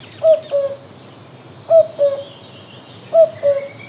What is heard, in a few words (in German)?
kuckuck